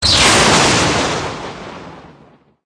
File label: Electric Thunder; enel